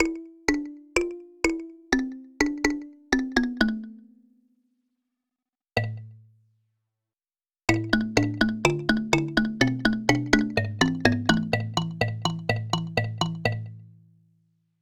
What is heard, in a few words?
kalimba